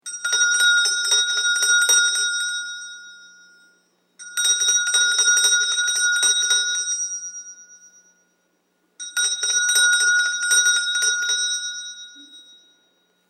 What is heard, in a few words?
Indian Bells Casmir; Musical